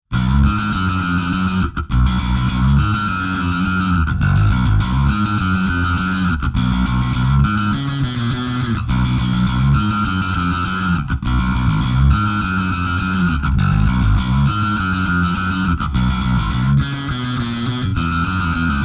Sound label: pawn shop punk